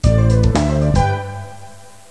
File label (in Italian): suonerie musica jazz